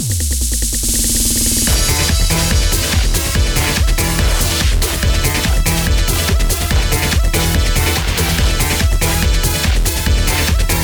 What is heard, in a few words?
BGM Classic; Pac-Man Championship Edition 2 Plus